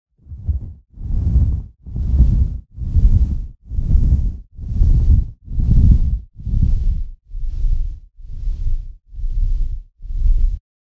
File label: big dragon